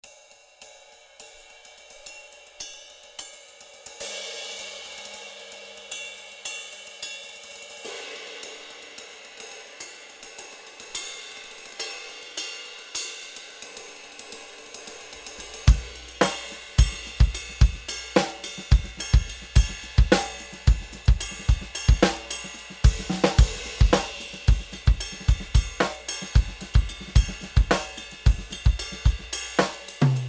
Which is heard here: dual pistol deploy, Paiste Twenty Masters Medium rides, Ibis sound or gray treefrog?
Paiste Twenty Masters Medium rides